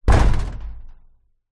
impact metal corrugated loose

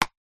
sport handball hit hand

